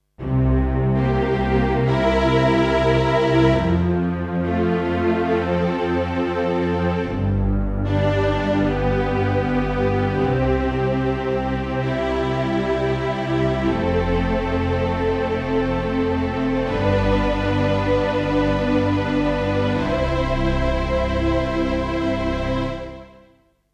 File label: Alesis Concert Strings